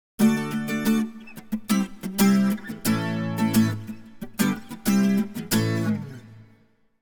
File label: Acoustic Guitar - Happy Rhythm; Hollywood Edge; sound designer's toolkit v2